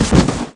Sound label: Baddie Collapse, Falls